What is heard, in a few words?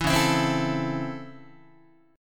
Db Minor Major 7th Sharp 5th, Guitar; 4A,4E,5A,5D,5G#,6C#; strummed